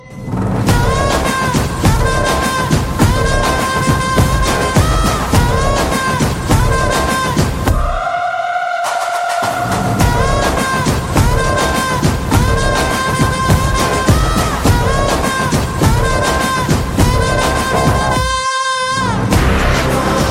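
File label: Intro background music Ringtone; Daaku Maharaaj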